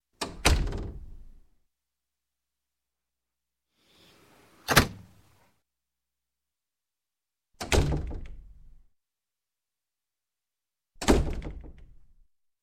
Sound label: Door, Wood Close Lightly; Sound Ideas 2000 Series